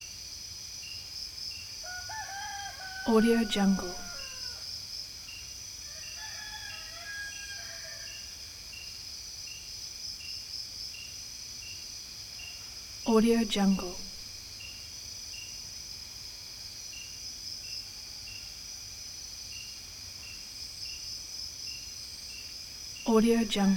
forest ambience malaysia 4am crickets

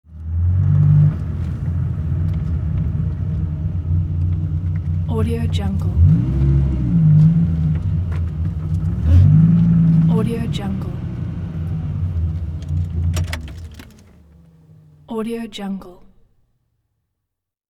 sports car parking maneuver

